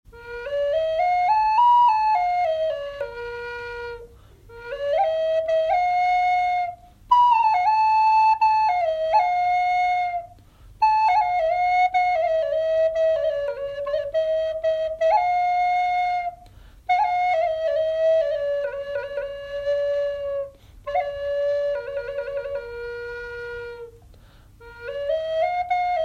lakota sound, flute

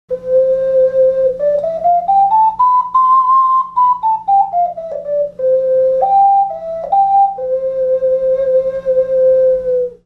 6hole ocarina Sound

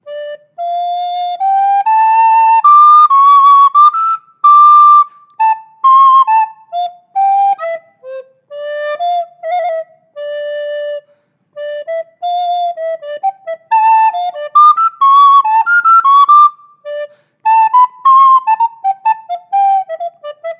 Mollenhauer 1042d Student Soprano Recorder

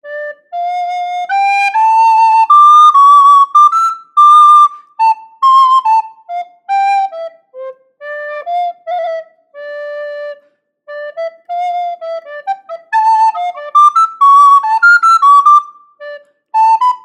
Yamaha YRS23 Soprano Recorder; FluteShop

